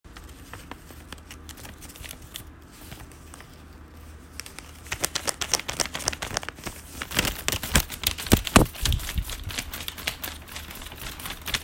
Paper Instrument

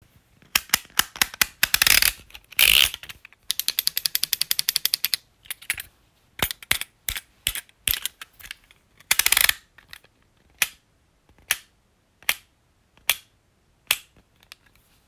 Box Cutter

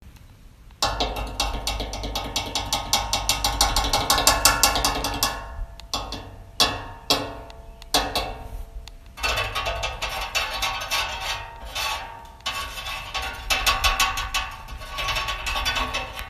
Frog

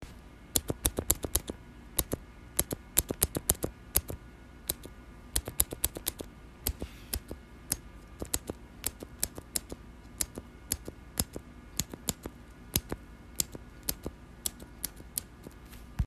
Mechanical Pencil

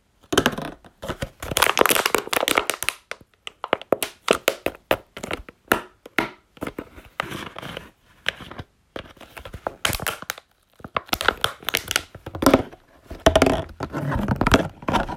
Plastic Bottle

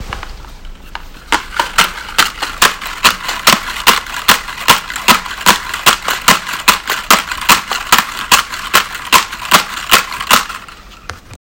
Paper Instrument

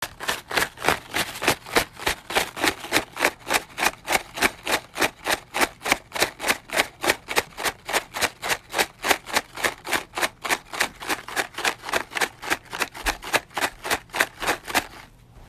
Paper Maraca

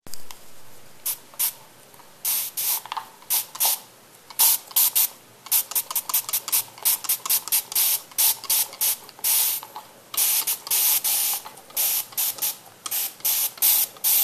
Spray

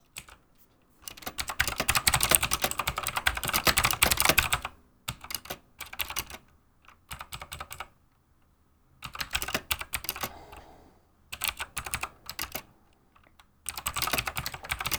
keyboard typing

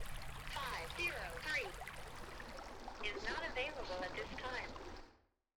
sting

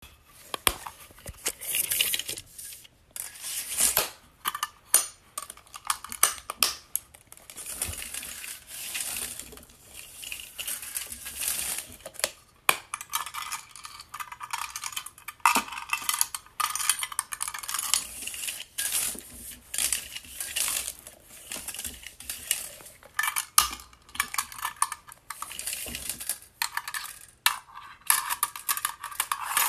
Object interaction

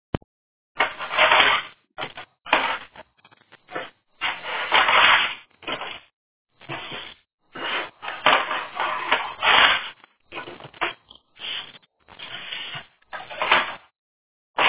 Paper gatherer

